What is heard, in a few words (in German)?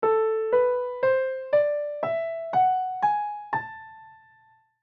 melodisch Moll